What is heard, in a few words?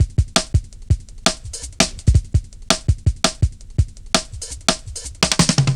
Rim Shott, Drum Loops 166.5; Zero-G Jungle Warfare 1, 2 & 3